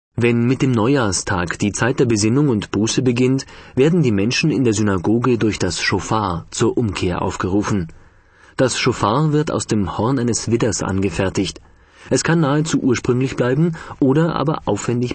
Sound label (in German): Schofar